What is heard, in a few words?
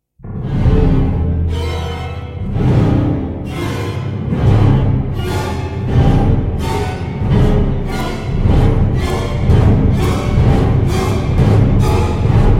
no choir